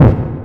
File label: hit, sfx; rust game